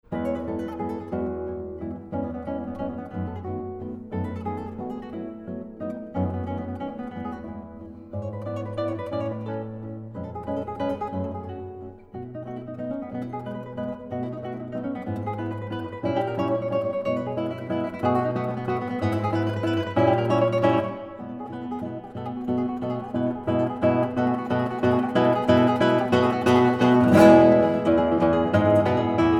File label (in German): Gitarren Duo